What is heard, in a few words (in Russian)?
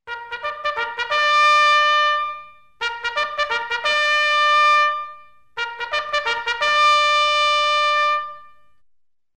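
военный горн сигнал "тревога"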